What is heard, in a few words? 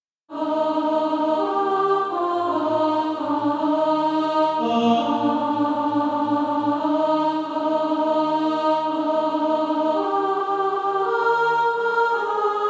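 alto; SATB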